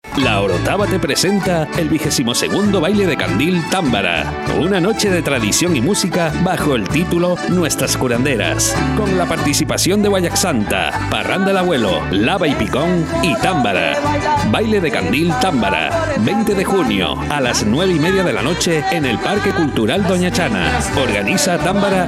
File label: tambara